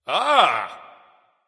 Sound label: "ah", discover; smite; voicepack; ullr